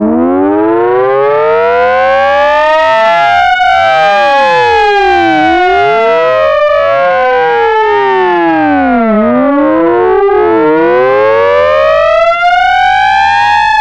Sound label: civil defense alert siren